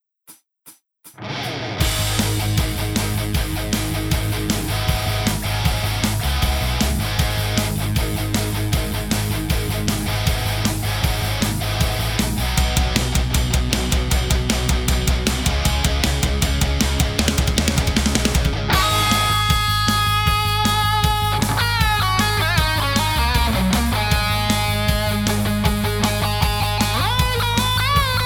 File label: radio outro, instrumental talk show intro and outro theme